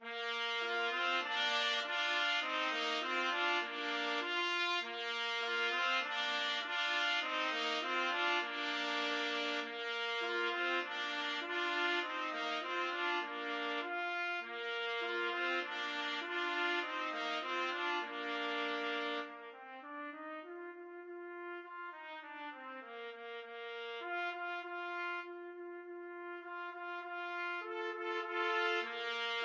alouette trumpet duet

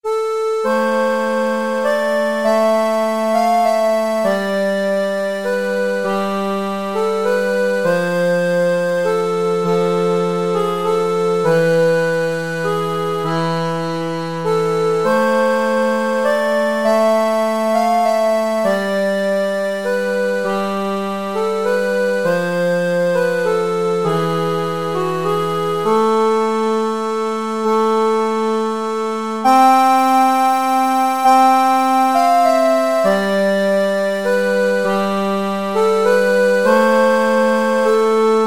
greensleeves, accordion

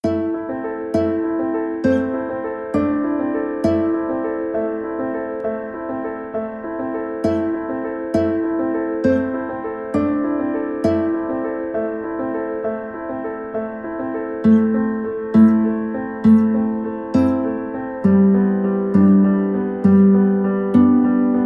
guitar

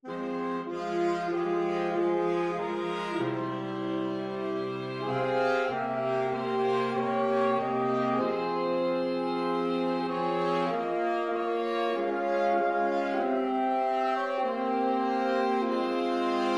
el cant del ocells, saxophone quartet

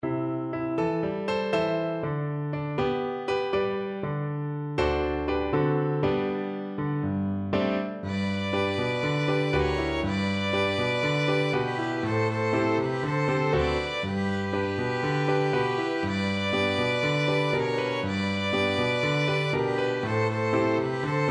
yellow bird violin duet

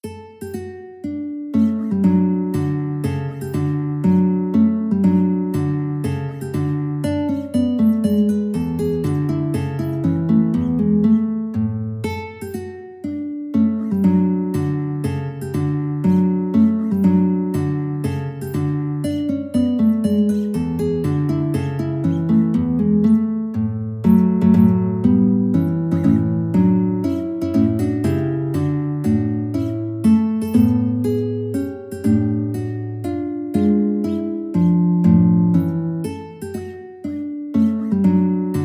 Minuet, guitar

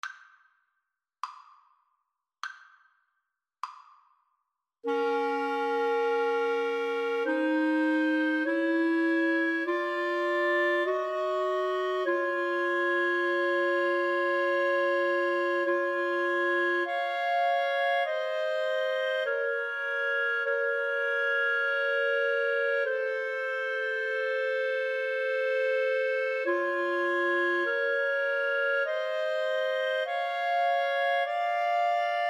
Clarinet Trio

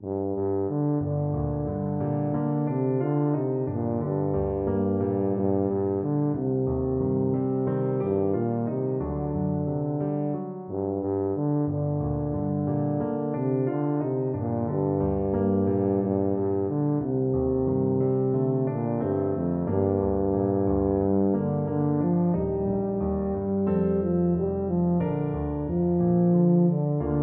wayfaring stranger, tuba

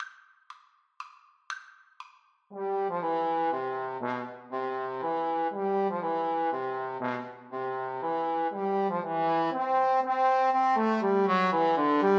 Trombone Duet

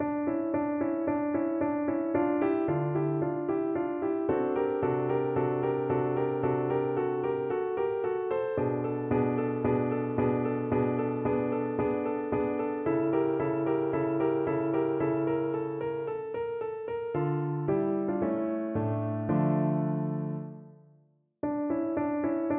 for behold, Tuba

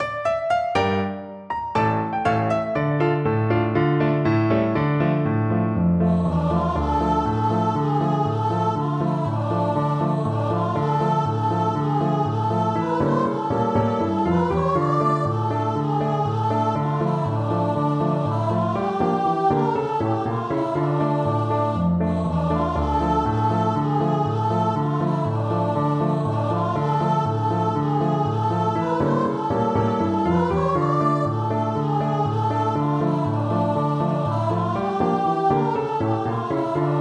mazel tov VOICE, unison choir

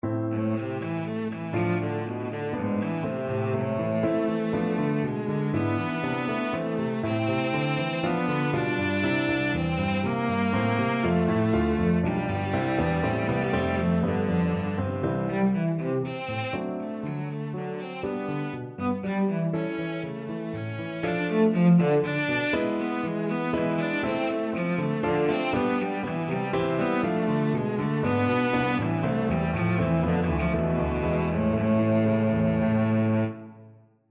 cello

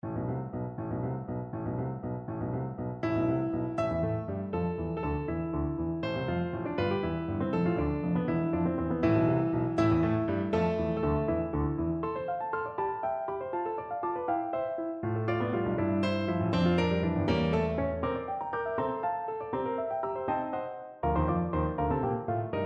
scherzo PNO

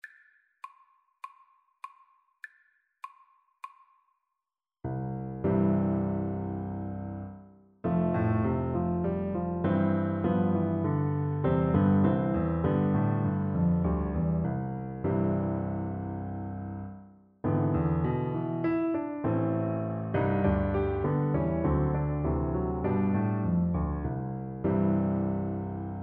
reverie, Bassoon

